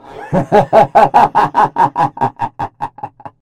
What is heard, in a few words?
teleport; freak fortress 2; blightcaller awakened